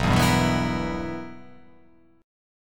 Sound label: B Major 7th Suspended 4th Sharp 5th B